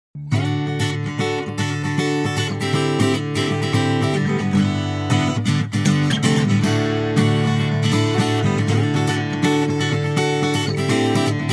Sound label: Guitar